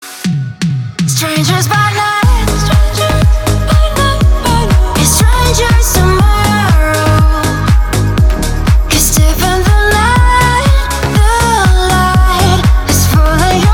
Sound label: organ